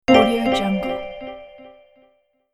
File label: corporate menu pop up